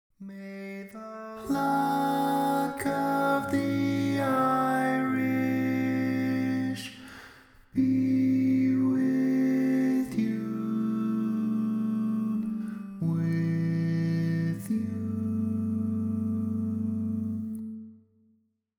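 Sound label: Tag, Baritone, barbershop tags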